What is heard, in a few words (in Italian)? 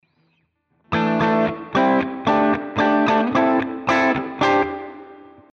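Esercizio, ARMONIZZARE la pentatonica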